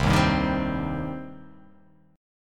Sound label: C Minor C down; strummed; JGuitar